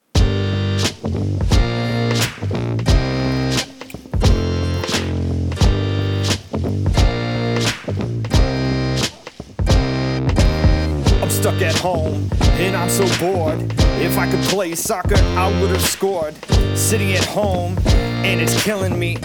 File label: quarantined rap